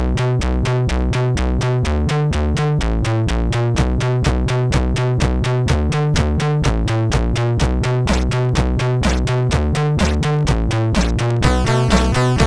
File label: Mummy intro, sid MOS6581R4